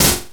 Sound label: grind, EMU XL-7